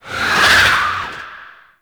Ship Cannon Whiz By, Flak Cannon; Warframe; Railjack; RailJack Weapons; Ghost Ship

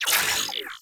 Ship Advert Hologram Off; Corpus Ship

